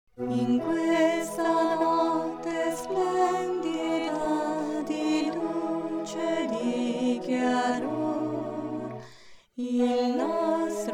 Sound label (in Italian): contralti + base; In questa notte splendida